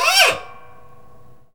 WIND SOUN

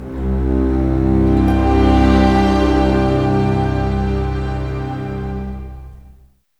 CLOCK; East West; Scoring Tools; ORC; Clock Time

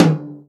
tom, Real Drum Kit, jungle kit

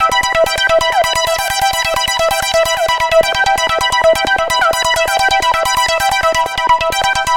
Lead Arpeggiation, EDM; Sonic Foundry (Sony Creative Software); Afterhours EDM

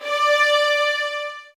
D, soft violins; Q Up Arts; Denny Jaeger Master Violin Library

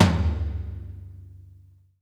FLOOR PROCE, TOMS; Sampleheads; New York City Drumworks VOL-1; SP TOMS

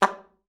SLPA G3, ALTOSAX; Xsample VOL-2

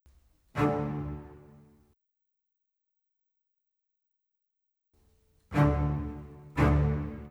Cello, Orchestral; Sonic Foundry (Sony Creative Software); Orchestral VOL-2; Modern 24

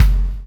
CHAIN; Spectrasonics - Backbeat

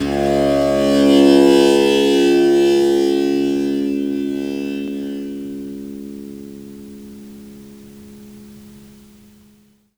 Indian Percussion Tabla; Mango Loops

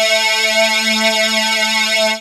CHORD, DRONES & PADS

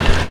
tech fx; Best Service; Hyper Dance; EFFECTS

